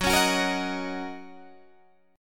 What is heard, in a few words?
Fsharp 6th down Guitar Open D